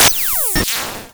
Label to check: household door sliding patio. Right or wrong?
Wrong